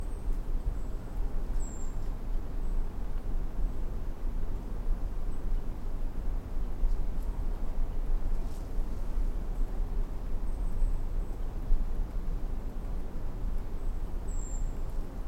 Brown creeper, birds

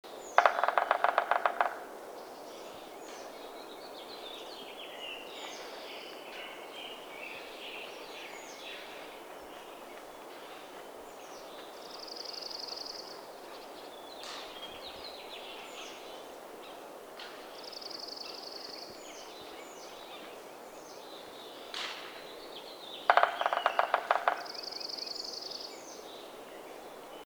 Red breasted Sapsucker Sphyrapicus ruber; birds

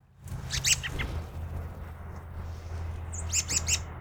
Robin Call; birds

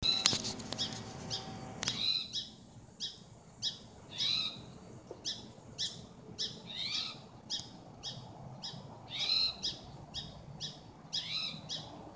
spotted towhee

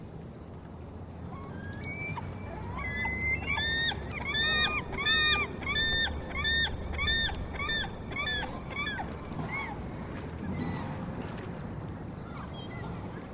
Gull voice

